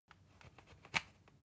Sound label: usb port metallic out soft